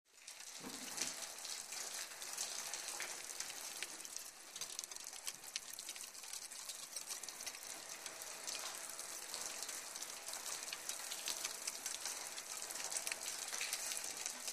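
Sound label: Water Dripping A Metal, drips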